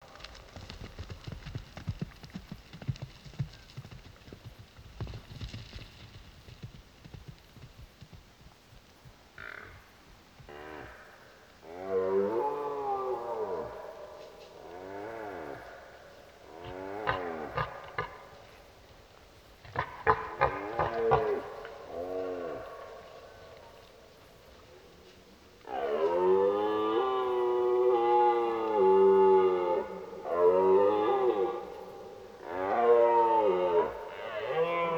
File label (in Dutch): drijven; Burlen